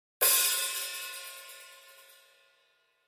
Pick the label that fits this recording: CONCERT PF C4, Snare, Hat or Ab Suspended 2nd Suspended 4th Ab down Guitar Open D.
Hat